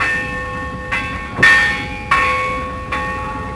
buoy; WhiteOut

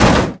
bouncy aircon hit; bouncy objects; Tony Hawk's Project 8; XBOX

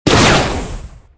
hit wall; demp2

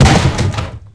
hit potty; hits; Tony Hawk's Project 8; XBOX

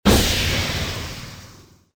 airlock open, door; movers; doors; Soldier Of Fortune II Double Helix; XBOX

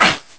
roar

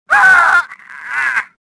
death, worker; worker3

